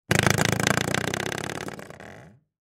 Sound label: Door Stopper Move, Door Stopper Movement; Miscellaneous Doors; Blastwave; Household